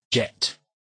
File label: jet